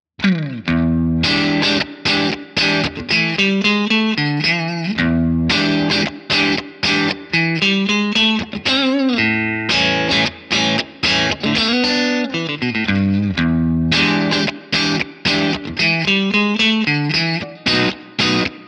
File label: breakup; jensentone